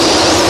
Sfx Winter games

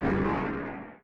Dark Monster voice death; enemies

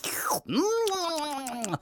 AMB China 3D Amb; Rabbids Multiverse

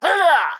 Pecheneg Red Attack

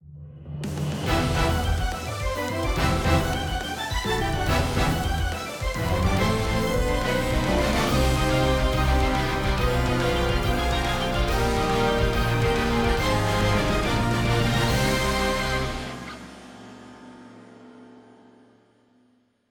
Suddendeath; Capcom Fighting Collection 2; PS4, Switch, Windows, Xbox One

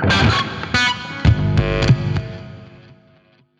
guitar fail; Love, Money, Rock'n'Roll

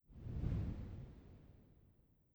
Switch Room

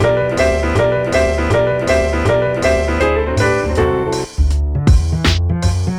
New Bpm80in R; Tanuki

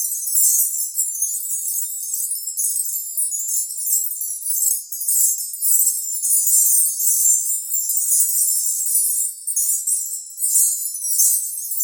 Magic Sparkle Gem Loop

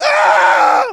Male Die; Mars Sortie (Arcade) (gamerip) (2018)

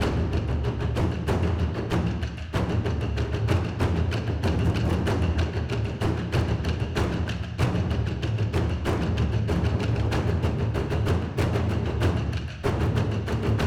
Colosseum Intensity

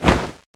Flap; Flycaller

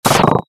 shot icecube; Starbites

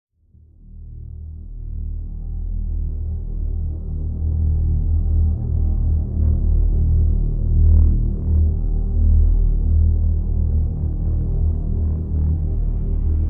Rise; Diablo IV Vessel of Hatred Original Soundtrack

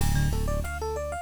Effects Magic; Spiderman Cartoon Maker

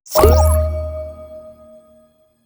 Double Jump Token

